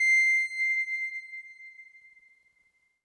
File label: Synth C, Synth Prophet One Shots; Electronisounds